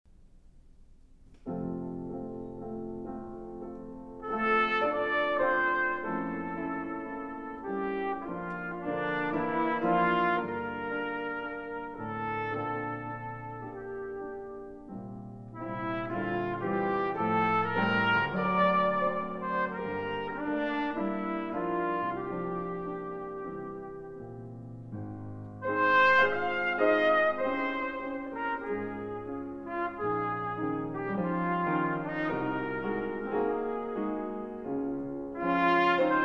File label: Piano Tpt; Arensky Poetry